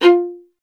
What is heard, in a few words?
STR VLA, Solo Instruments